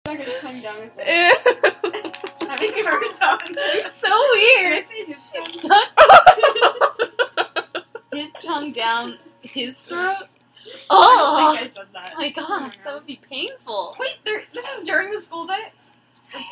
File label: laughing tv